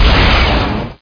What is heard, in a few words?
Tnk atck, tank; q2